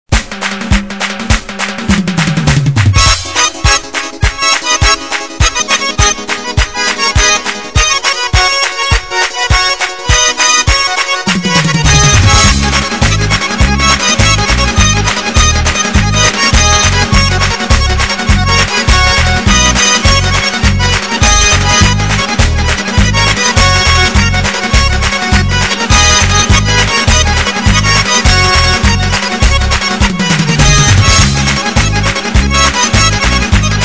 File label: ingushskaya lezginka